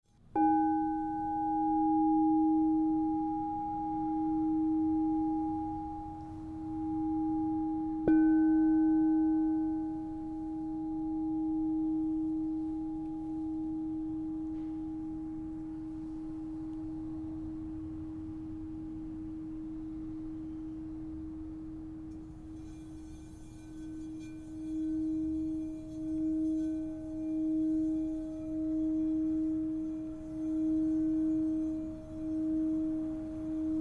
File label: Crystal Tones Singing Bowls